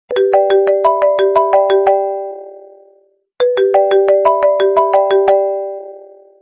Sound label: marimba